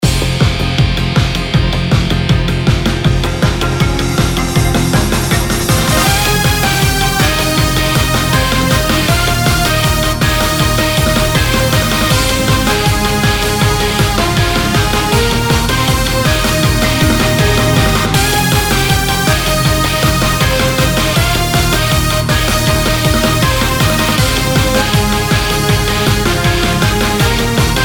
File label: battle